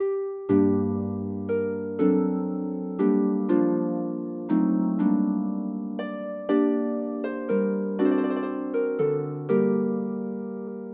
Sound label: for flute harp